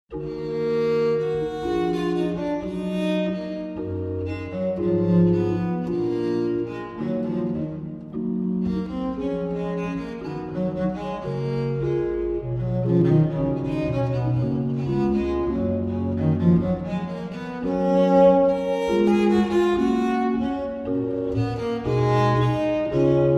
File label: pipa